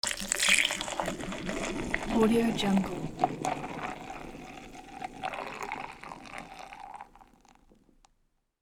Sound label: pour beer